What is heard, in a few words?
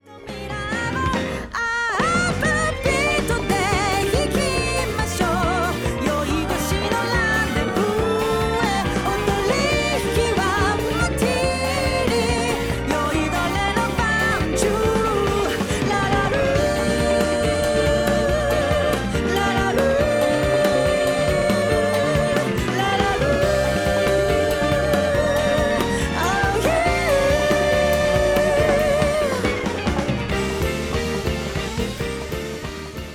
Mastering Effect Bypass